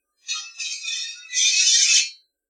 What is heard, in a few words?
Sword Draw Slow